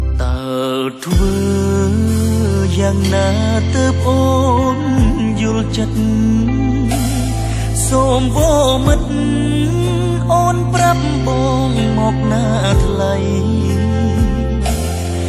Khmer Song

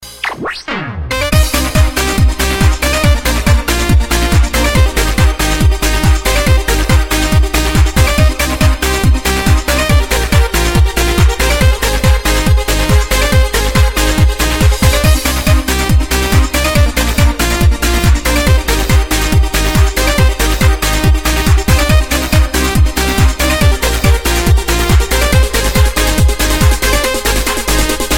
Techno Music

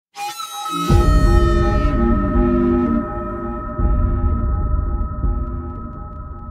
Whistle; Mandalorian

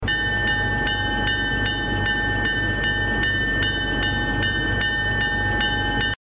Trainz Default Bell

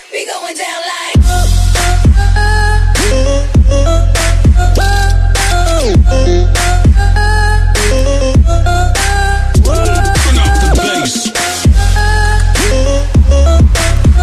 bass

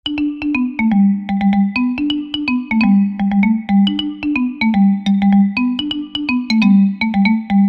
Ringer

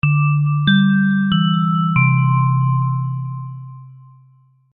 Glass Bell

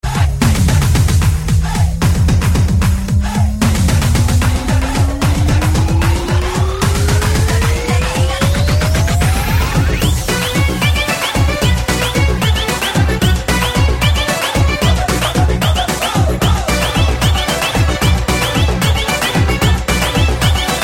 Dhol Tone

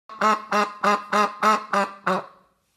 Cute duck quacking

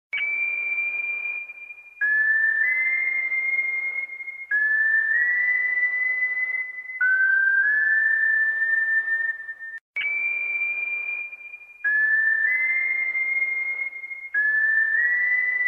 only whistle

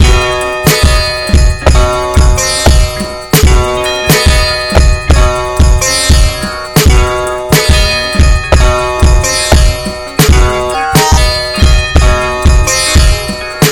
TAMBOURINE

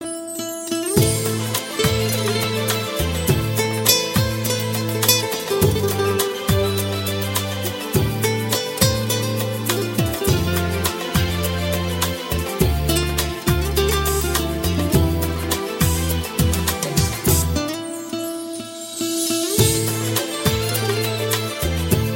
Romantic Guitar

